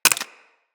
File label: Bullet Impact Debris Wood